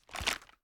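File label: foley inspect; weapons; gmod; viper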